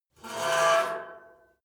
metal squeal saturated short

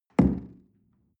coffee place tablecloth soft wobble

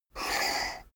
poker chip slide table short; soundpool

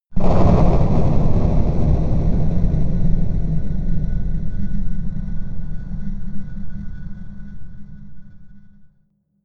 crushed boom, impacts; hits explosionen

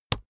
keyboard laptop enter push hard

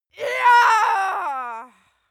female cheer; schreie; menschen

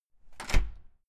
entrance door close slam outside

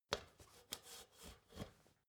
step ladder metal scrape single

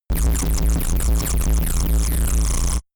space charged laser blaster overload big; krieg-waffen; soundpool

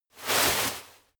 step walk graze grass

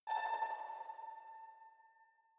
sci-fi facility ai awake damaged light, signale; soundpool

